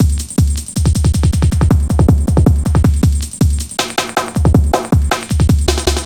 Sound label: Drum Loops, 158.5; Jungle